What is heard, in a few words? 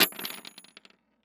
Weapon Bullet Shell 3030 Shell Cases Multiple Cases Fall On Wood Floor; AdobeCC